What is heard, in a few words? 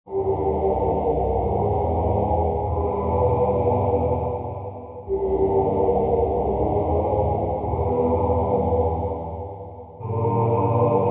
Music Vox; raji an ancient epic